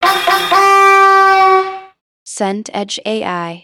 train horn

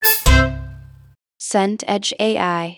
sting comedy

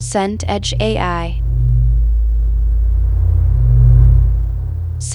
wind gale open

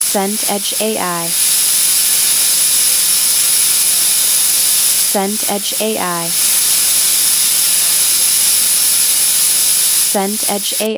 rain on leaves

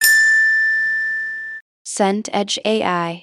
handbell ring

